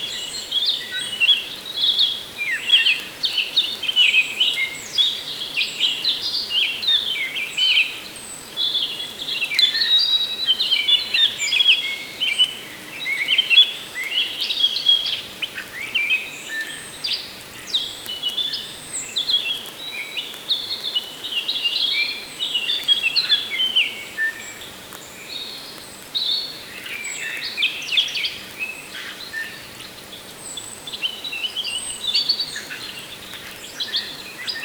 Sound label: morning birds chirping in, rainforest